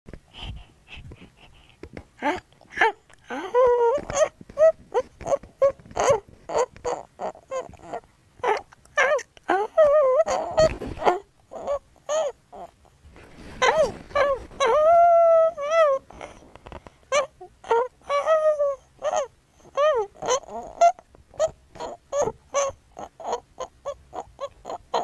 メス犬キラキラなおほ声; メス犬キラキラなおほ声出た★

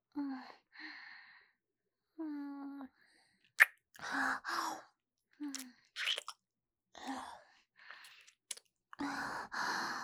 キス; マゾハーレム

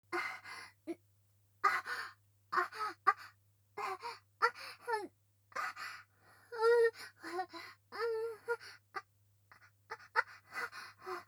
喘ぎ 普通 後背位; 淫乱メイド

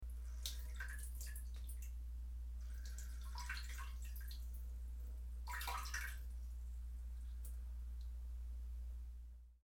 おしっこ 声無し ちょろろろちょろろろちょろろ, トイレ盗聴 排泄音; 排泄音集

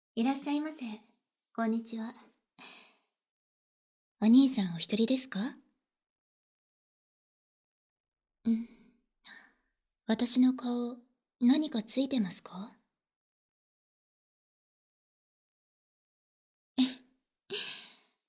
吐息×耳舐めコース(環境音なし); 耳舐め耳ふーサンドイッチ ミコミコ耳舐め庵 神様のいる茶屋

